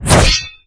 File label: Attack; MapleShrine; mkxp; gameasync